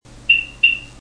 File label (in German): Kontamination, rauchmelder; Pyrexx